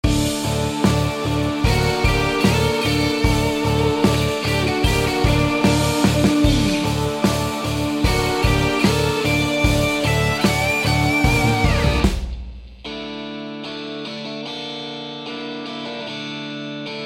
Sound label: guitar amp